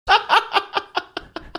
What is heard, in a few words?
laughing